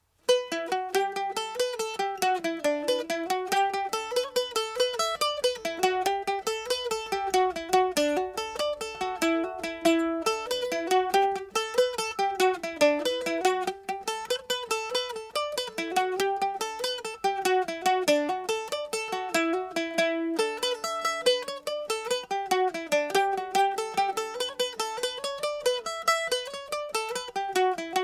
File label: condons frolics em mando